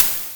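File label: SD Hat Open, Simmons SDS5 drums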